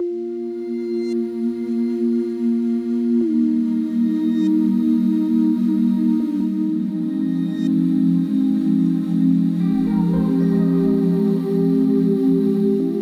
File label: Intro Lush Pad; BB 2HTC Samples Vol 4; Pads